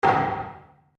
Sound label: Wood On Wood Table; Material components for constructing more complex sounds; Sound Effects:Materials